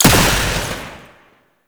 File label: Pistol Projectile Embed; Weapons; Warframe; Tenno; Temple Signature